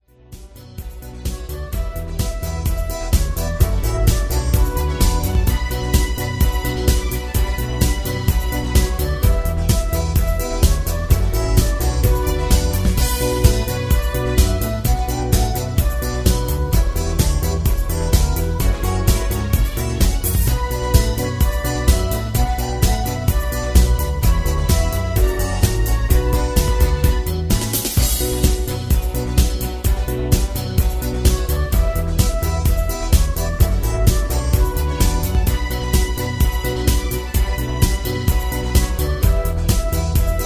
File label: Patter